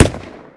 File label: firework single; best service Blue Box